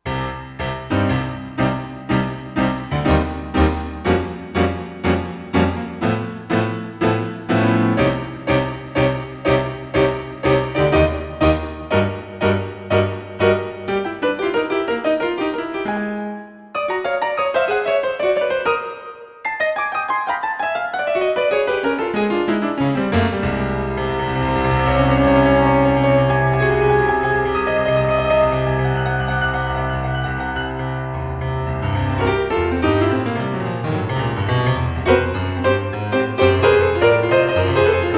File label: Grand Piano; Roland SR-JV80-03 Piano